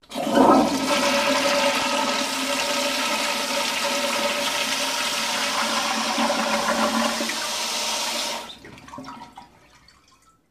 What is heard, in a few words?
Toilet Flush, Public & Private